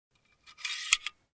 Construction

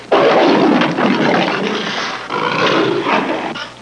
Leopard growling roaring

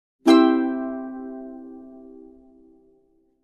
Full major chord strum

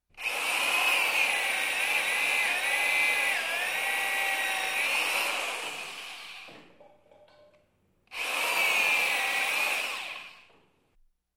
Electric Drill

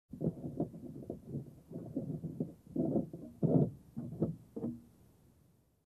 Rumbling from outside the car

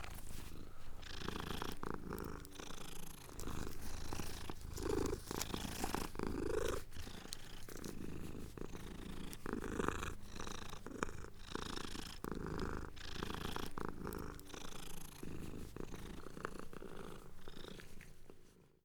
Cat Purring Licking

